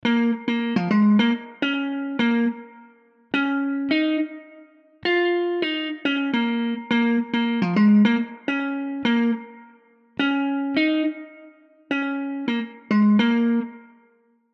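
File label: B flat minor 80 bpm, Reggae MIDI Melody; Antics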